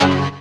KEY, SYNTH

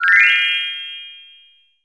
coin

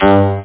XWAVE; Scream Tracker Sample

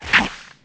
bite

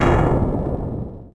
SMACK

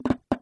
pickup collected; Panic Volcano; Pickups

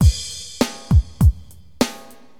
DM LOOP, HipHop, Drums

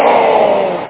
crowd che

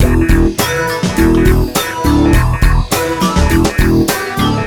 Funk acid; FUNKACID1

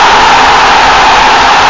atmos

